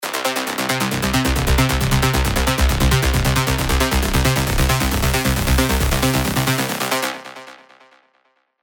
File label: Old School Flow, rolling bass; Revealed Serum 2 Rolling Bass Vol. 3